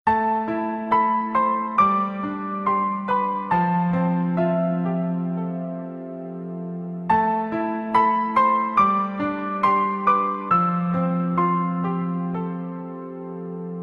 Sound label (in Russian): pechalnaya igra na fortepiano